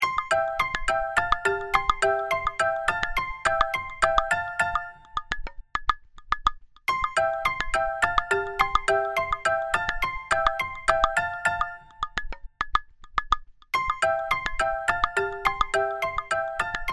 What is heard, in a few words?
Board Game Stock Market (Positive); animal crossing amiibo festival